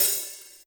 soft hit normal

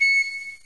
pipe

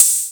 Hat

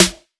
normal hit clap

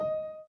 Piano Chord

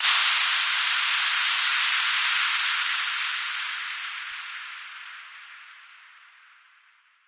Finish Windchime

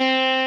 Arp.

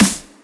drum hit clap

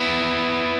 Arp.

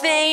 vocal

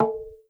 bongos low rimshot

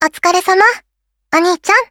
applause

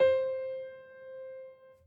piano sus

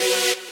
saw

